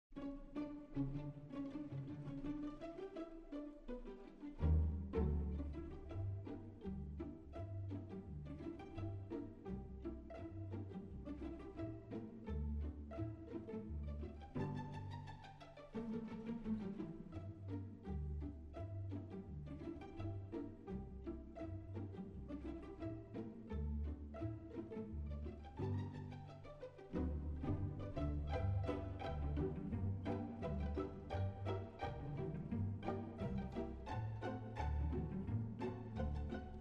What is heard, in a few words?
Jazz Pizzicato